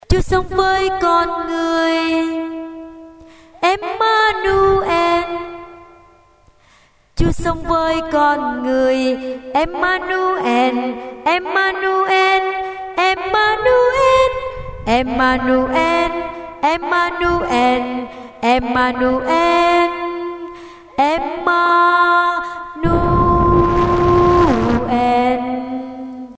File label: basso